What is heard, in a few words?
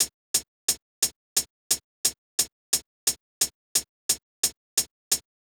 Hat, Drum Tracks; Hip Hop Exotica; Apple Loops; 088 C#min